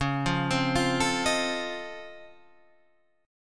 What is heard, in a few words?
C sharp Augmented Major 9th, C sharp, down, Guitar, Standard; arpeggiated; C#+M9; JGuitar